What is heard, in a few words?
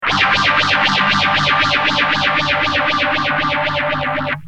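guitar DOD phasor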